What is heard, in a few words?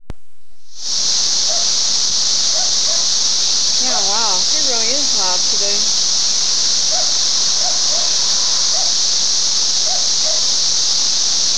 cicadas